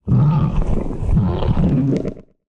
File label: eat; Barotrauma; Barotraumatic; Crook